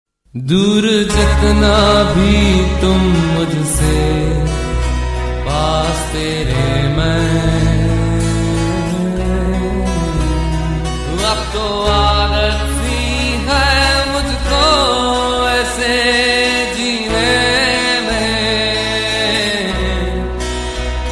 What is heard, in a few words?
Unplugged